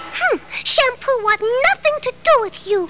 hmph; Ranma

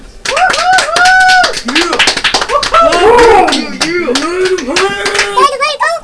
clap

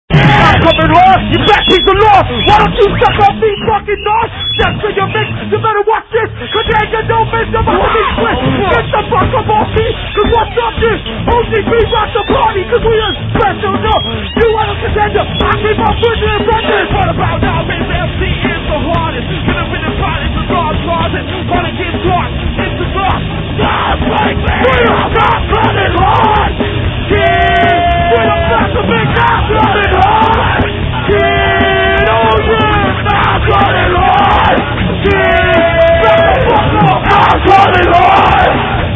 kid

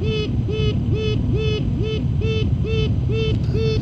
Queen piping

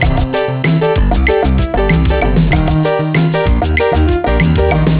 beat

